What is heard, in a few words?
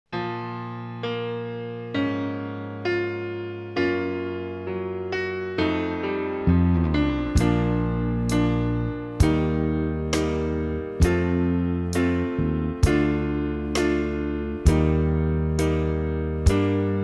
Playalong, Sax; Sax Plus Vol 3